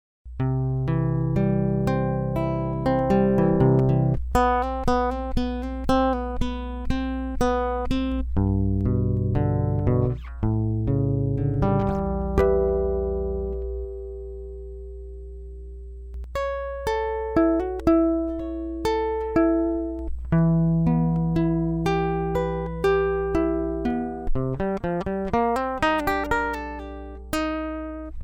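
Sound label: guitar, Guitar 2 (accompany)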